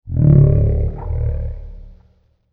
Dinosaur Low Rumble; Dinosaur Sound Effects; fantasy sound effect; Andrew Fleming

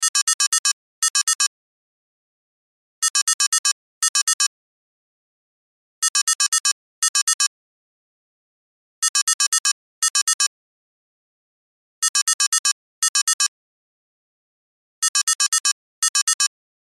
Cell Ringtone

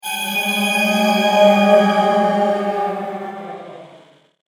Fantasy Disturbing Event, evil sound effect

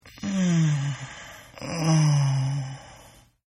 Moaning Male; Groaning; Sound Effects:Voice

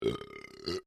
MALE BURP MEDIUM; Male burping; Human

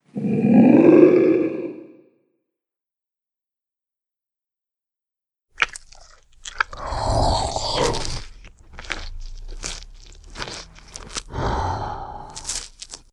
Dragons, monster; horror

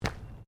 FOOTSTEPS SNEAKERS PLASTIC GROUND MAT STEP

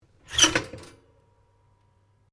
Toaster Old Popup; Various kitchen appliances; Household

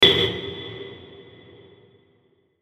Hit Ping Dark Decay, Hit Ping Warble Filter; Pings; Blastwave

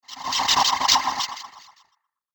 Information Whoosh

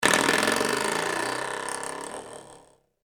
Foley Door Stopper Movement Spring; Miscellaneous Doors

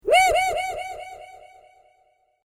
Created Creature Small Ping, Alien Sound Effects

